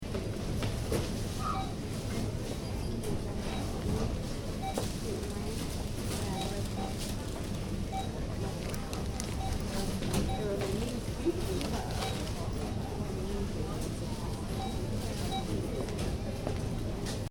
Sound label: Interior supermarket, Supermarket, Interior: Ambience, Tills Beeping In Background; Stores and Malls; Backgrounds; Stores And Mall Sound Effects; Stuart Duffield